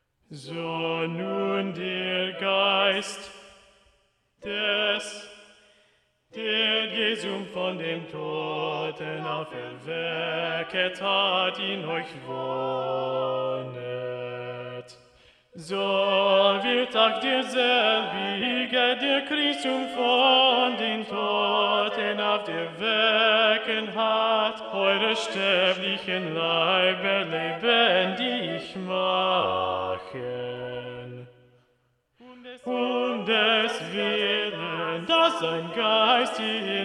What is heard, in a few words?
Jesu meine Freude Movement 1 Bass Predominant; Movement 10; Bach